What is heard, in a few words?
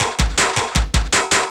LOOP L, Drum & Bass 160 BPM